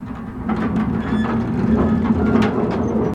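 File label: Slide Metal Door Squeak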